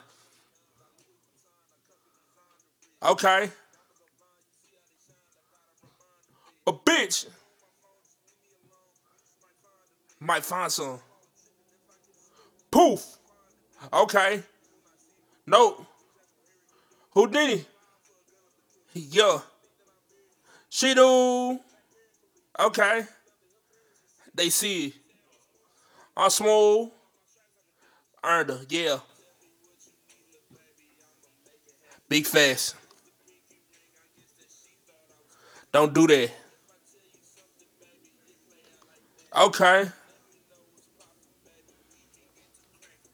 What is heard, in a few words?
Acapella - 132 BPM D Min Ad Libs (Dry); Vocal Loops; Cymatics; GHOST (Beta Pack); Put It On